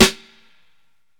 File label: long tail reverb snare one shot f sharp key, steel snare drum